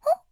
system notification; Windows; Madobe Nanami

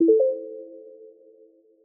warning; Linux; Celestial 2.1

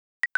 Alert Activity Goal Behind Salient Haptic; i OS; iOS10; nano

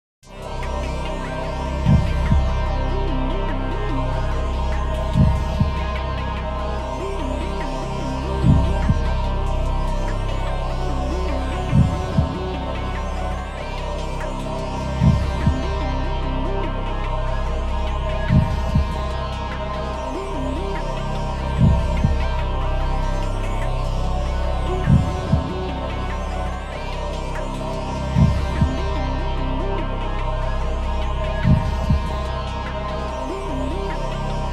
Guitar Loop; Super Millionaire II; Millionaire Soundtrack

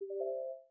RECORDING STARTED; Windows Media Center (Windows 7)

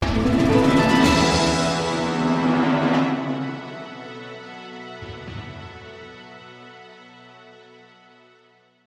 Correct; 32,000 Correct (Non safety net); Millionaire Soundtrack; UK Revival (2018)

